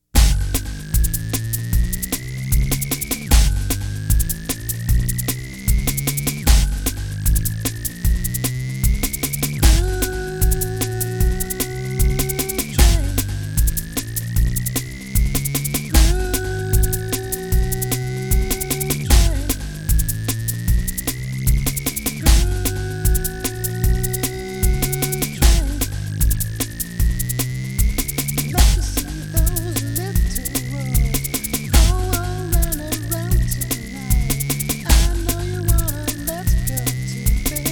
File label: groove train